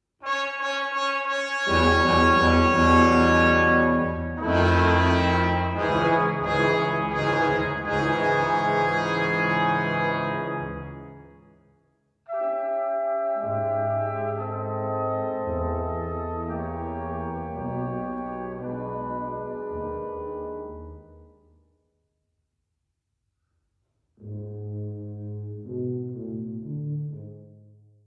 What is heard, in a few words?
Brass fanfares